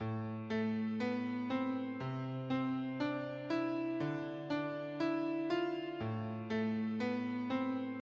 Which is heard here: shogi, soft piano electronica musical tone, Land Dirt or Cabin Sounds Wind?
soft piano electronica musical tone